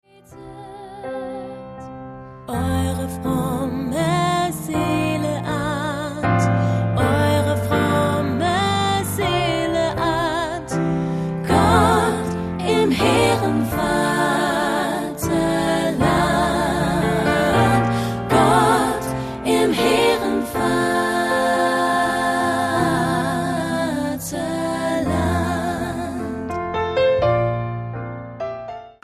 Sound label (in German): schweizerpsalm